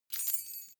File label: key ring large fly tinkle; objekte; schluessel